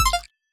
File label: arcade killshot